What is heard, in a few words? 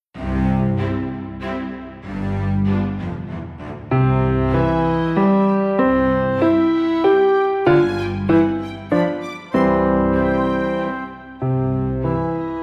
Classic Dance Slow